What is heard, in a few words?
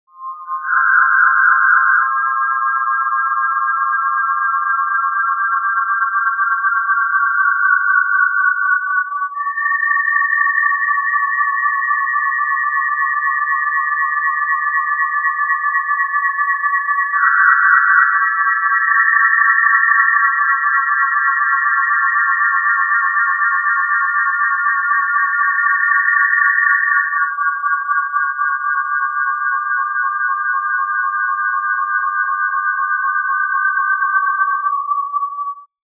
radiogramme; zs1agx